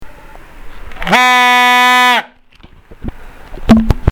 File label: trump horn